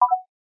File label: Folder Opening mono, iOS Sounds